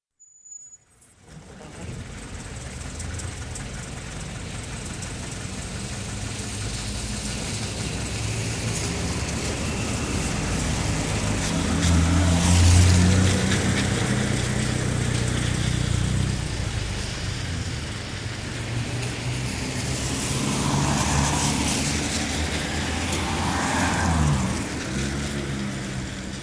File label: Town Traffic on a wet road in a one-way system; Traffic and Car; BBC